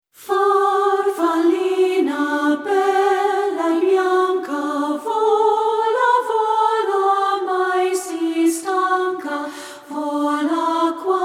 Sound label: A Cappella Italian Folk Songs; Farfallina